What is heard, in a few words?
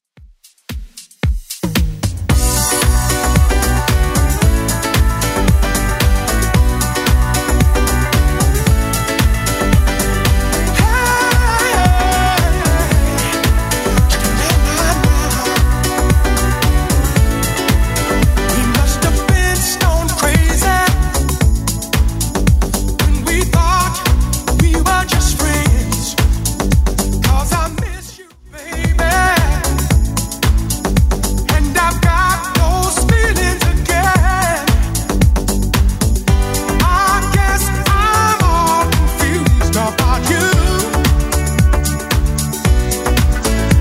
90s DJ Friendly, 113bpm; Redrum